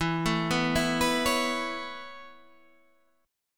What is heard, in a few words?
Db Minor 7th Sharp 5th E, chord